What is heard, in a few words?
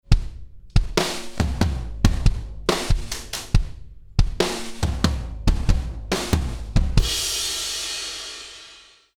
DRUMS CLASSIC CHANNEL